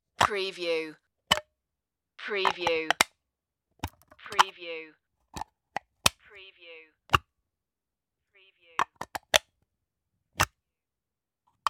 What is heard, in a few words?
plastic box open and close